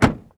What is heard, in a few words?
BMW DOOR, Transportation Doors Hoods Brakes